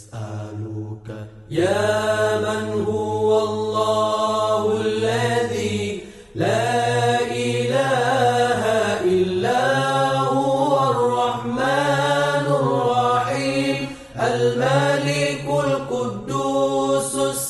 Old Voice Islamic; Asmaul Husna; اسما الحسنا

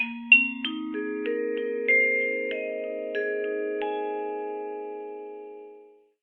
Mood, ringtone; Samsung

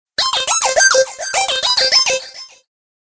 Bumblebee, ringtone; Samsung

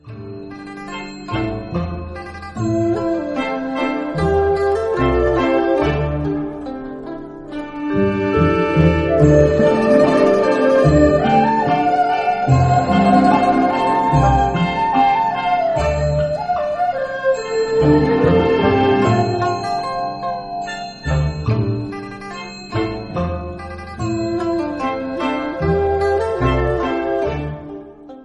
Chinese Ringtones

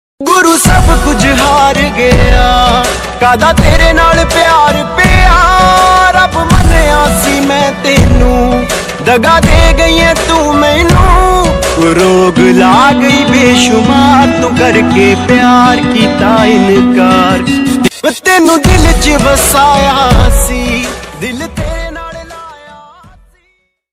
Punjabi song ringtone flute; flute ringtone BGM

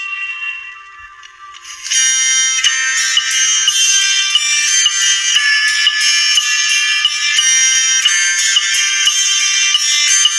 Nokia Ringtone Official Ringtone

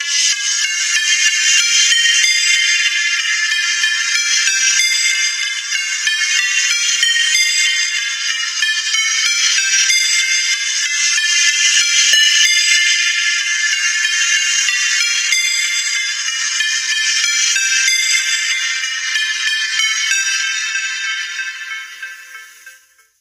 Nokia Ringtone Stock Ringtone

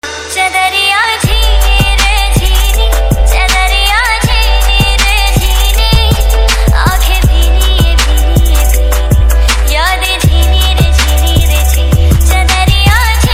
Love Song Ringtone, Sad Ringtone

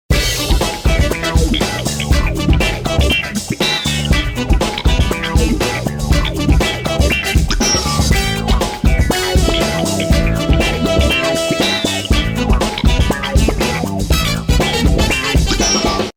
Cool Breeze, ringtone; Samsung

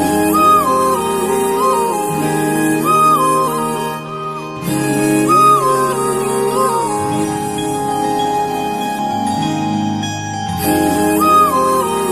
Sad Ringtone; shayari ringtone; WhatsApp Status tik tok ringtone breakup ringtone

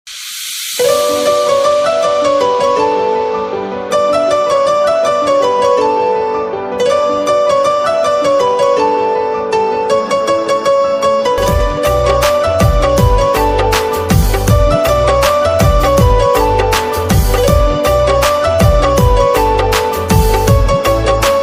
Instrumental Ringtone

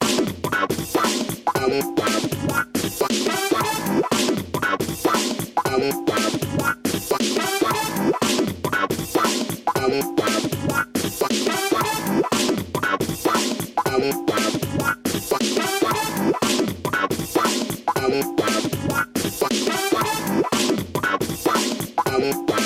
Funk Yall, ringtone; Samsung

